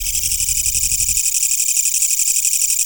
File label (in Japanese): kutuwamusi